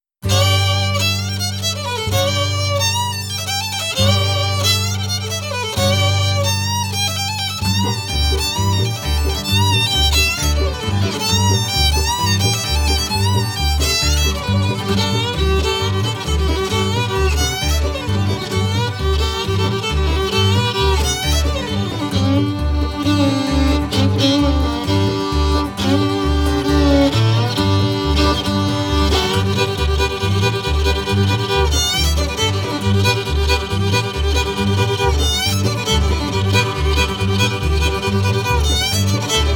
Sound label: Blues; Lost Train